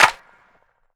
clap sound a key; percussion; single hits one shots

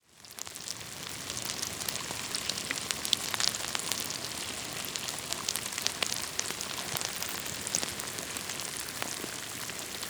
maggots; hydrophone recording of 1000 maggots in sticky wet mud; soundscapes

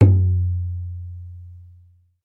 low tom drum f key; tom drum samples; one shots

